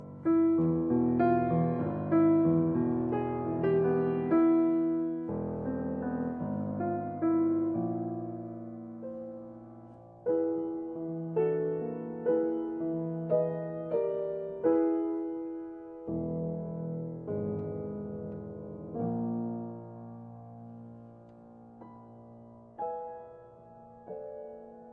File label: Piano Sonata